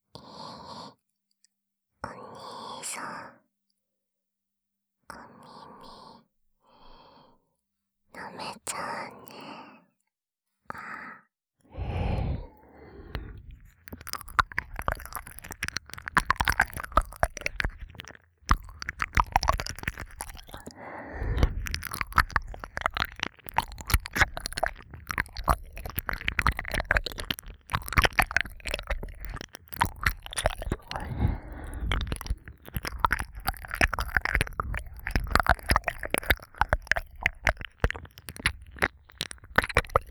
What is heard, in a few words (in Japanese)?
耳舐め, ASMR; KU100; 隣のあゆちゃん 〜しあわせ妊娠H編〜